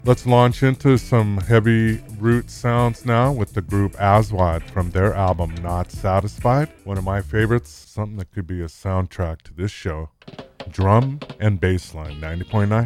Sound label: drum bassline